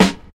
hot rap steel snare drum sound g key, acoustic snare sound